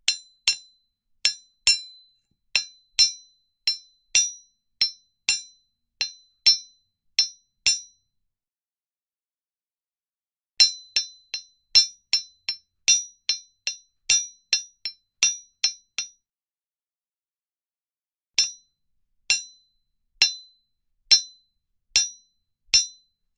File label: Instruments Hammer Sm, hammers